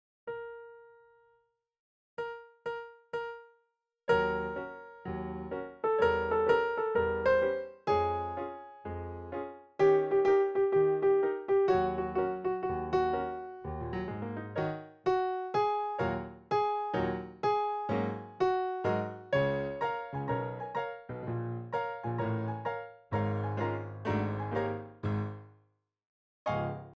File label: Female Ensemble Plunk